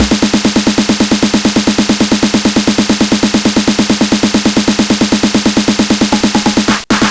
Game Boy Techno Fill, 8-Bit, Drums

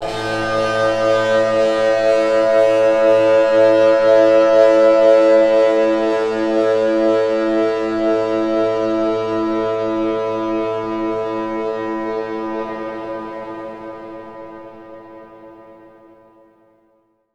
FX DIST; FX DISTO

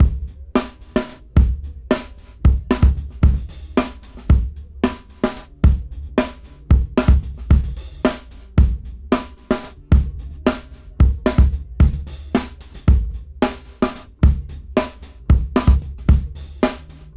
Brown Dry 111 BPM; AMG - Keith LeBlanc Phat Butt Jams

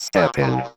VOC, 160 BPM, Drum & Bass; Ueberschall; Drum & Bass Flash Trackz

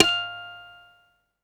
guitar F, GUITAR STEEL; MIRAGE

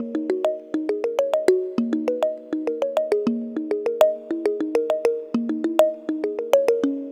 Tongue Mallet; Melodic; Ambient-Melodics-II

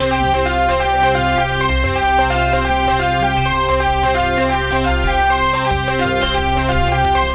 GROVING KLICK, HOOKS 150; Masterbits - Tekkno Trax

